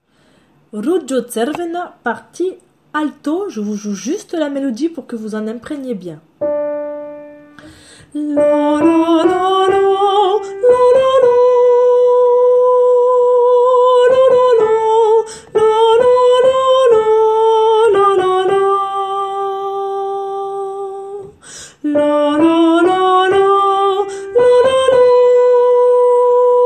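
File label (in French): alto sans paroles; Ružo crvena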